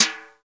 SNR XBRUSH, Snr Brushes; Brush&Rolls

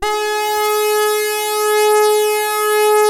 SAW RES WET, SYNTH; Keith Emerson Signature Series

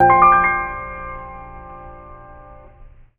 PIAN, PIANOS; USB Soundscan vol.02; AKAI

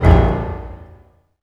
HIT A#, Orchestral Loops; 128 COAST ROAD

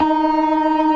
CLASSIC C3, Synth and Organ

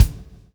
PULL, Backbeat; Spectrasonics - Backbeat

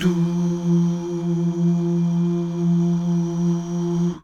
DUUH

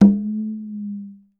conga, pop rock set

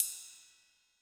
Ride Lite, Cymbal; Yamaha DTXpress-II

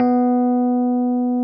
RHODES B2; Sonic Reality - Vintage Timetraveler

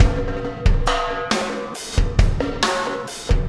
Impact Crater Beat, Processed Drumkits

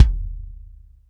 BD, Bassdrums, Roland TD-20

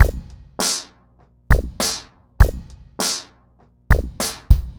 Remixed Drums 100BPM; The Roots Drumloops

